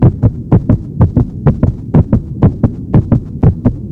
Shield Charge Loop; Warframe; Warframes; Garuda

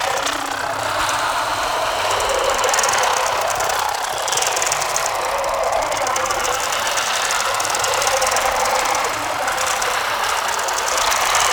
Passive Clone Loop; Warframe; Mummy

